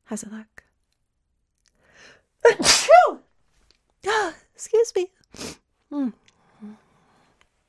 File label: sneeze bloop